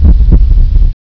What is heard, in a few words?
heartbeat loop